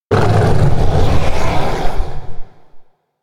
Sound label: elec disasterbeast roar; anemoi